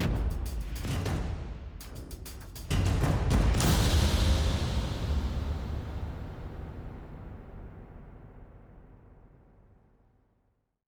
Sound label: drum trans; LoL; Game Music Events